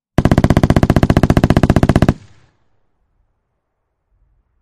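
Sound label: Hk21 Machine Gun Burst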